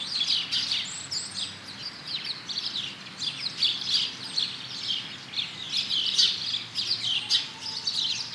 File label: bird; Mafie 1